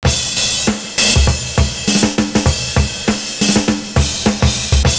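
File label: RIDE FILLS, 100 bpm; Univers Sons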